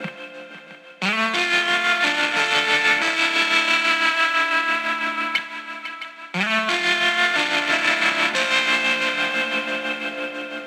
90 Gm Electric Guitar, Downtempo; Drums- Downtempo Loops & Samples; Sanctum

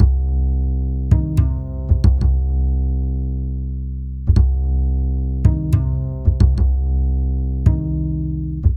Blues Bass Dreamy C 110

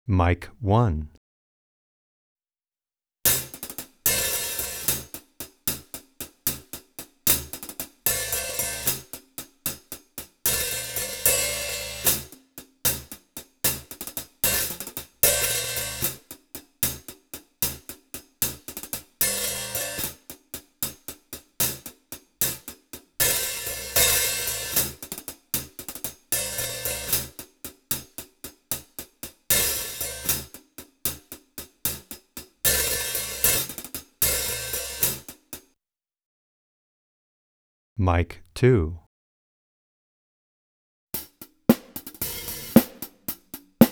Hi-Hat

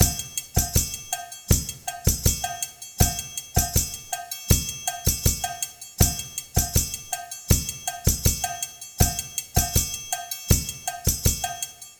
percussion samba mix

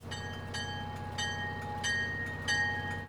TRAIN crossing Bell; trains

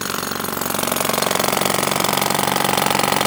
Street work jackhammers

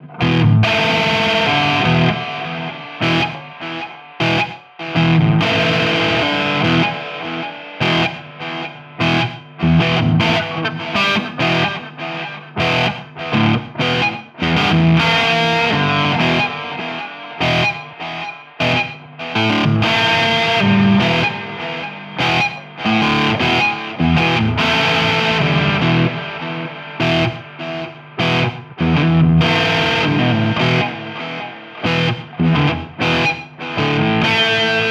Blues Distorted Guitar Rhythm Em 50

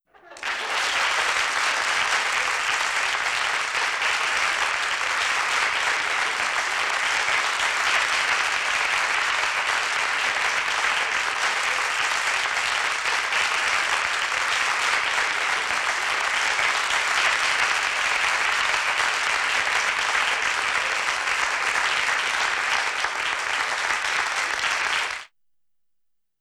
People Applause Big Long; group Of People

